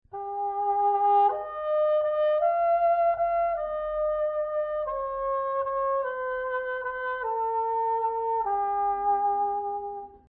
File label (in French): Sourdine seche Trompette